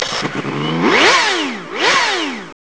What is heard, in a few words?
Starter; Amiga Soundtracker sample